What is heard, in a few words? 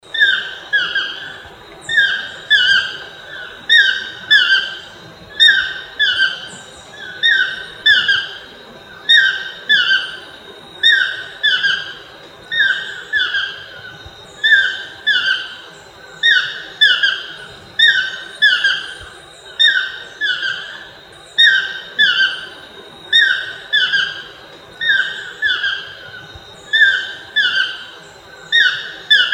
Ramphastos ambiguus; podcast